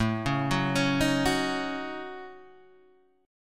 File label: A 6th Flat 5th, Guitar, DADGAD